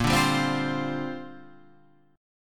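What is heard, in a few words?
A sharp 6th Add 9th chord, Guitar, Open F tuning; strummed; 10,9,7,7,8,7; JGuitar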